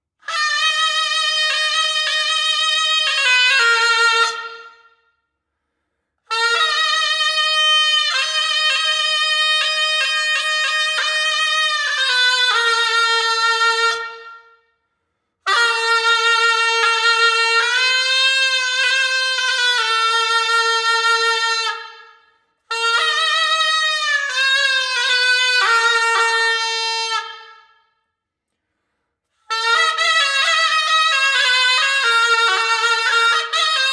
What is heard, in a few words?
Zurna